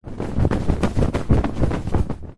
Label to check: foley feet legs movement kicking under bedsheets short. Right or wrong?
Right